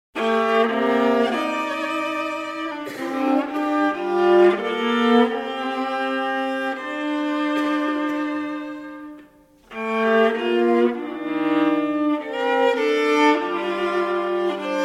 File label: Etudes Espressivo